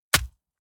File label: Stab Punch Hack; SoundBits; JustGore